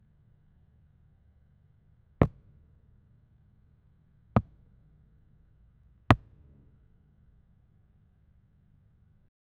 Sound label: hitting a golfball